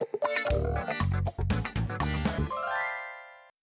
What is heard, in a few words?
game complete effect